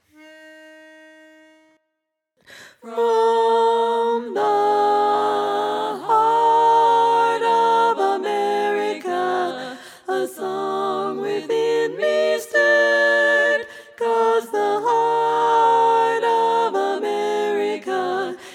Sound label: Regional Song Tenor